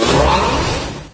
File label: hoverbike start up; There; ThereClient